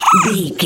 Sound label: goblin fairy attack fast